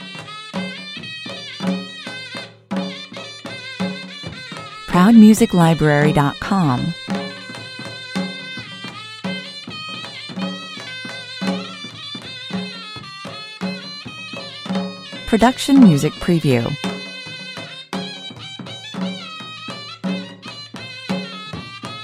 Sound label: indian village wedding music loop